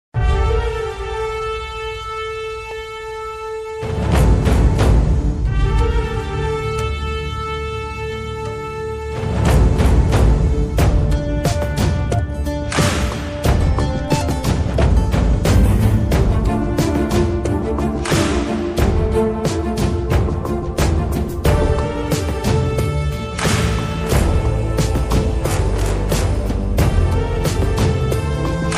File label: movie ringtone attitude ringtone; mammootty; cm